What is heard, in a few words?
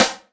SD, Dance Mega Drums; Best Service; SD-HIP